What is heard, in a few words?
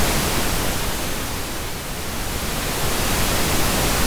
ocean